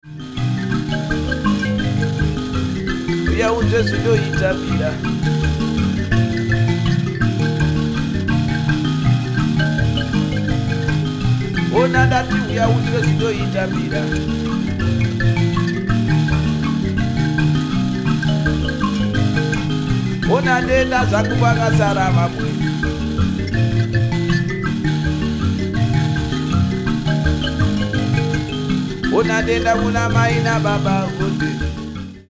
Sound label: mbira